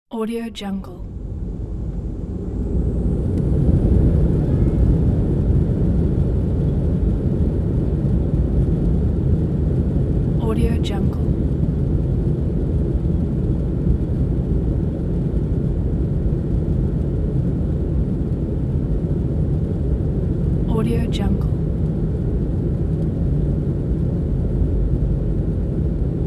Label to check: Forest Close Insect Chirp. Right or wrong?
Wrong